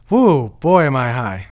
Flute, Singin' Flute

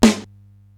SDS9 SN, Simmons SDS-9, Snares

